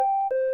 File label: connection stopped sepura; dispatch